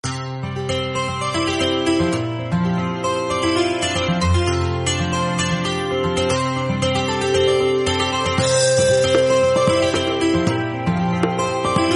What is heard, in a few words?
Samsung Note10 – Guitar Classic